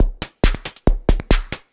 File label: percussion loops, Techno; PS-45 WAV Techno ID